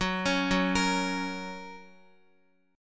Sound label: Gb Major Gb Guitar; Gb arpeggiated; JGuitar